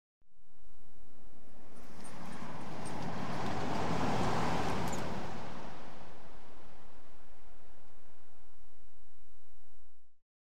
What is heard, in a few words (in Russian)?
mjagkij proezd